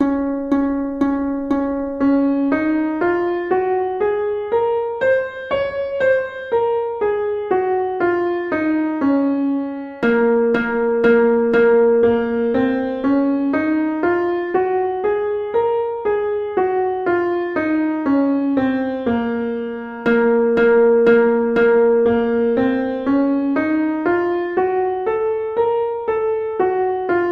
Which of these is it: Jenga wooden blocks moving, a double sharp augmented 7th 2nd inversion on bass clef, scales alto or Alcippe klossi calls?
scales alto